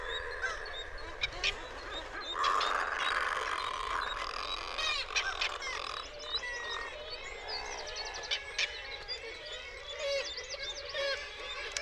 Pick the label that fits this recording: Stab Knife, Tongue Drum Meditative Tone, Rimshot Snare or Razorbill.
Razorbill